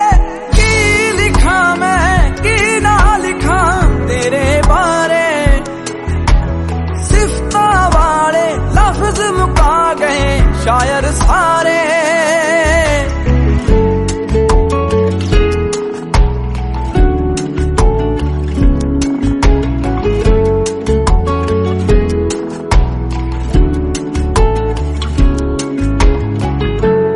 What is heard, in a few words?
Punjabi